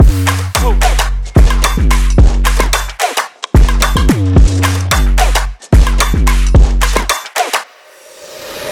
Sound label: dynamic street pulse loop